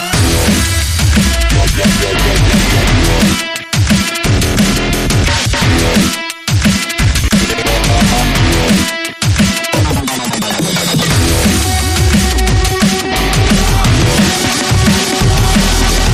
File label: Riser Zone